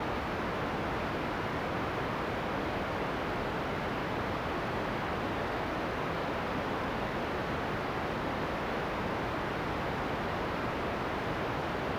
amb white noise

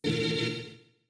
enemy shield AGGRO

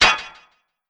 player hit wall; GameJamDungeon

